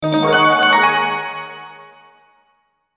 Logo Bells Synth; Logo Bells Synth Reverb; Sonic Logo

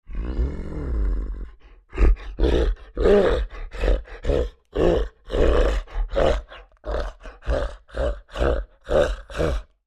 Creature Large Excited Panting; Monsters; Horror; BigRoomSound

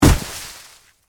Body Fall Snow; Horror, Body, Fall, In, Snow; Blood and Guts; BlastWave

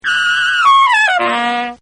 SAX Riff; Horns; Sound Effects:Musical

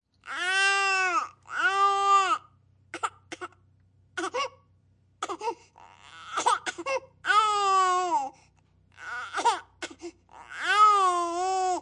Baby cries; Crying; Voice

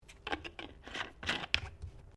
Bottle Plastic Cap Plastic Screw On

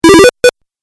8 Bit Style Small Bonus; Video Game Sound Effects

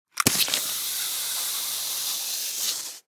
Grapple Gun Fire; Warfare; Miscellaneous Combat; BlastWave

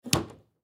Foosball Table; Foosball Table Players Move Hard; Games; Sports

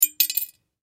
Coin Drop In Empty Glass Jar; Money (Sound Effects:Objects); Blastwave; Foley

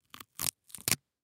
Banana Peel Rip; Banana Peel From Bunch Separate Food Fruit; Foley; Blastwave

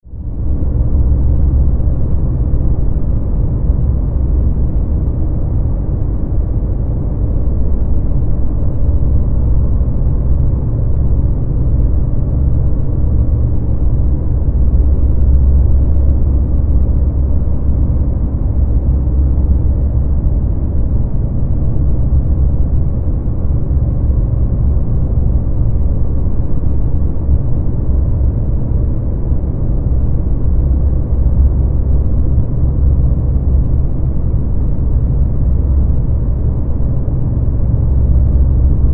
Drone, Vox, Reverb, Horror; 5.1; Sound Effects:Science Fiction; BlastWave

